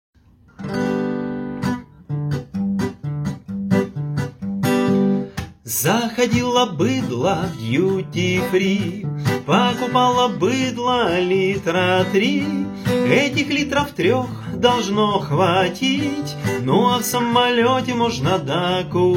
acoustic

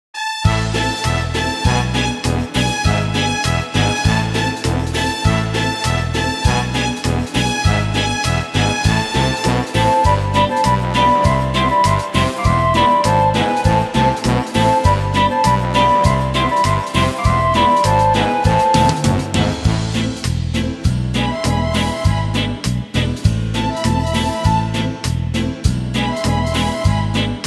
instrumental

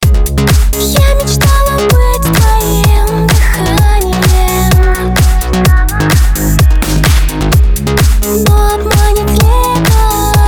Electro House

